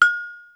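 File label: Agogo High, Yamaha DD-35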